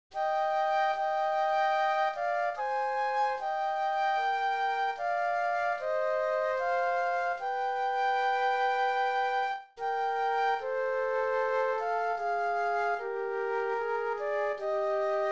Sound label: flute duet; classical